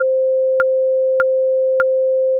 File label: metronome 523hz